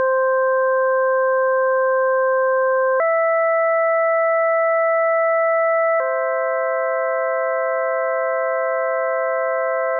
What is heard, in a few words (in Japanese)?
ド-ミ(平均律複合音); 実際に平均律と純正律の音の違いを体験してみましょう。; classical music